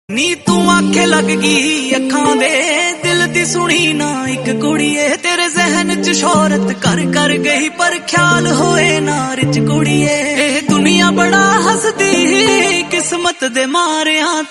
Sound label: Ringtone